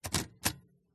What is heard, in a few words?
typewriter backspace; mkh8040; pmsfx